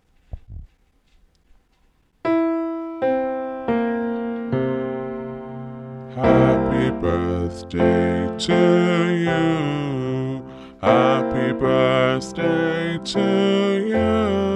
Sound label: Happy Birthday Bass